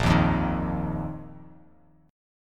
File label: A sharp Suspended 4th Bass Standard; strummed; A#sus4; JGuitar